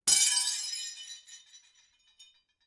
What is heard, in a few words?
Glass, Pint Glass, Break, Debris, Rock, Medium Distant; Airborne Sound; Elements Glass